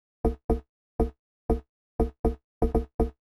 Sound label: Drum; hydrogen